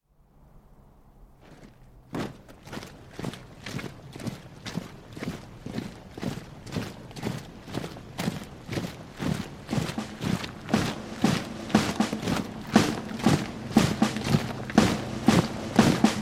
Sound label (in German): Landsknechtsmarsch